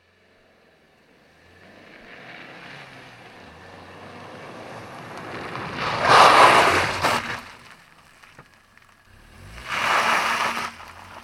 transportation car approach skid away gravel external vauxhall corsa 1.2; sound effects one